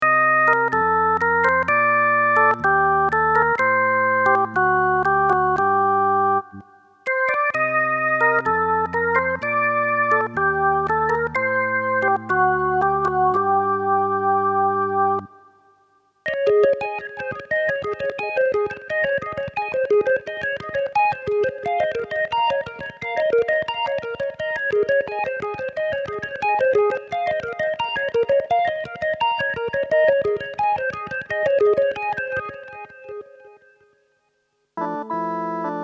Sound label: Hammond XK-4 Chorus Delay Phaser Flanger; MFX2